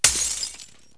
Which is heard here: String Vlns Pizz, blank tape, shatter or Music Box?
shatter